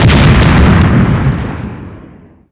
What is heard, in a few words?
Small Exp, Explosions